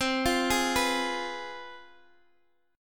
C Major 7th Suspended 4th Sharp 5th, down, Guitar, Half Step Down